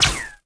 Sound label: laser Bolt hit; impacts explos